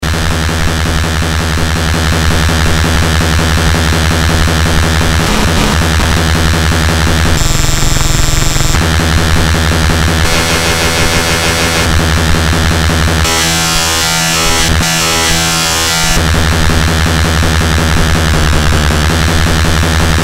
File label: Circuit Bent Casio CTK-550